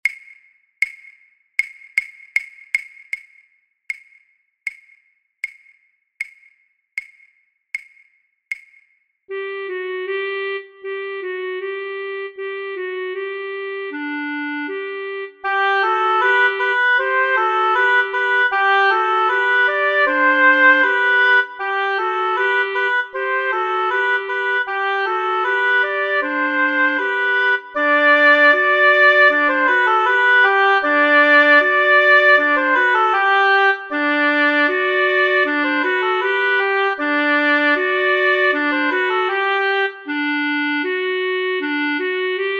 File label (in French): lent sans claves; Gavot